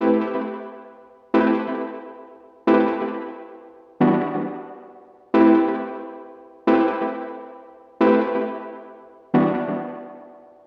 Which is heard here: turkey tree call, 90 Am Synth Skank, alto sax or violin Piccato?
90 Am Synth Skank